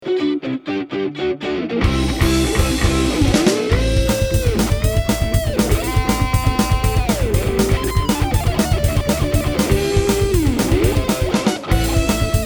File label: Solo